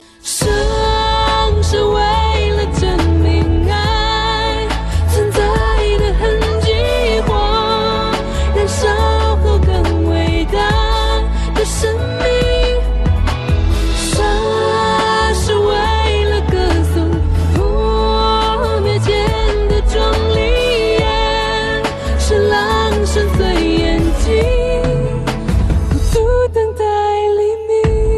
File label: chinese melodie ringtone